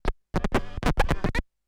Turntablism; Sonokinetic - The Roots Drumloops

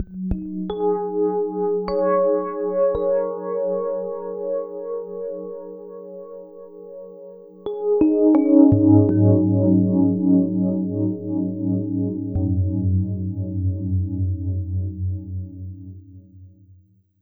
FM Keys; Sonic Foundry (Sony Creative Software); Slow Silhouette

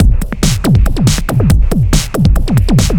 Kit 7 Odd Sig 140; off the grid

